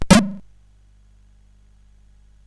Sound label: Tecnetium, CSG8580R5